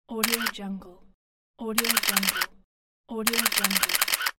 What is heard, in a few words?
camera shutter; wavbrulee